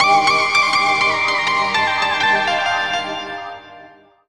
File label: Moomin Piano; M8